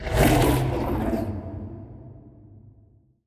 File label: void worms juvenile attack; Stellaris; grand archive; ships